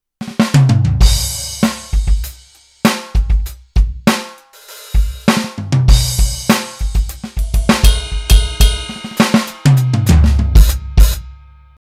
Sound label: Roland TD-4KP